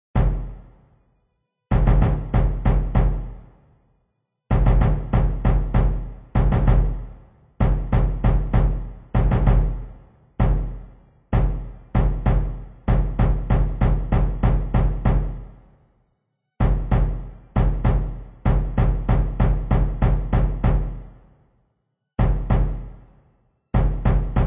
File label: Violin Drums